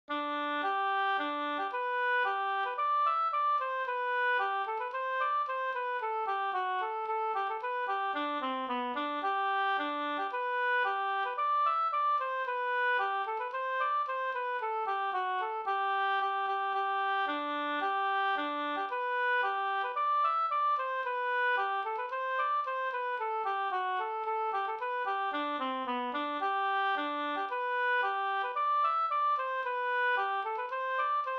ganglat Gdur; Taxingemarschen Sd

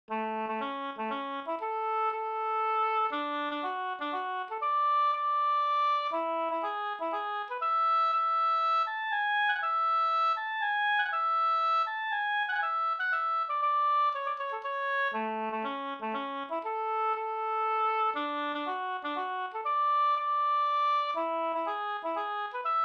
polska Bodapolska; Finn Anders polska

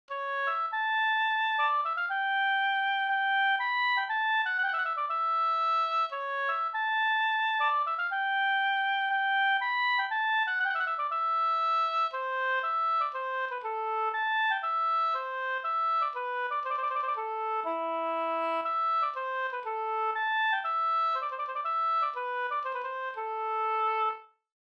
polska Bodapolska

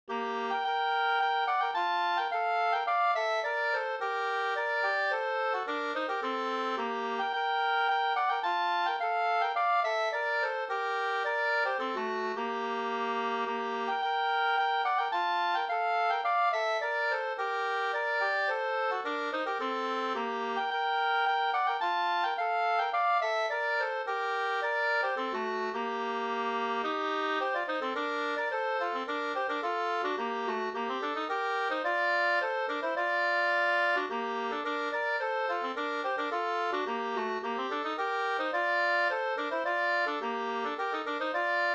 polska Bodapolska Gmoll

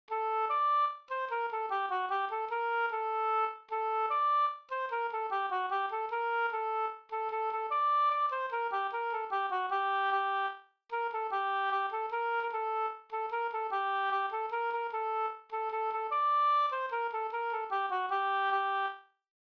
visa; Raven raskar; Arwidsson

